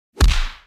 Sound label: punch